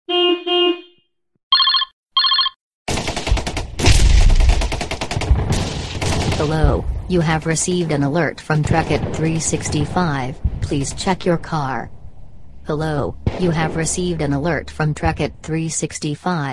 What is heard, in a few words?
Alert